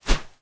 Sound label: general popup out; Candy Crush Friends